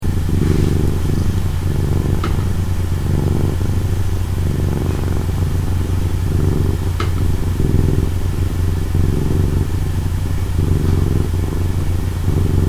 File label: purr